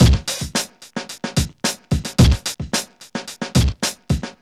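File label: 110 bpm, Funky loops; Zero-G; Funky Elements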